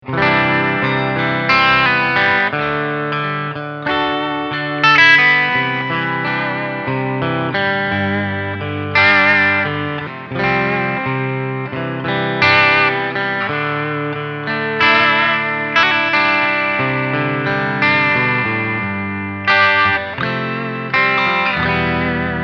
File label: Steg HB Clean Picking